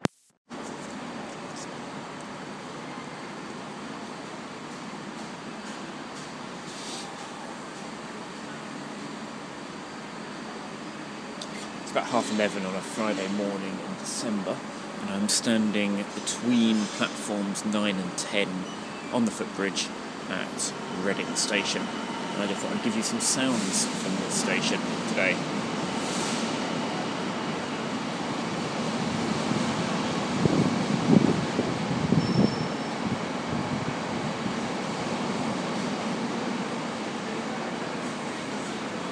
ambience of the main footbridge at reading station; in december 2013